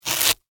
Crunch Floral Foam, Texture, Crunch, Short, Floral, Foam; Rip, Tear; Blast Wave